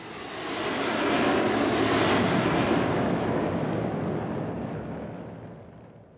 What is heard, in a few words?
jet; lasvegas